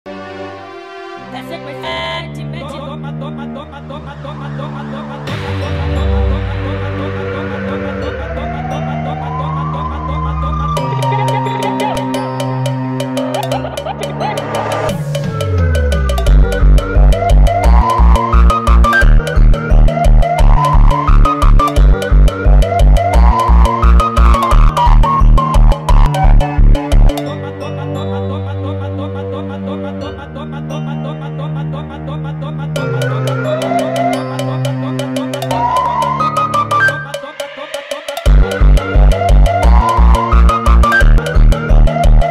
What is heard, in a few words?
funk sped up